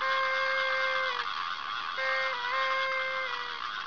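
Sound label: noisemaker; Image Bonanza; Expert Software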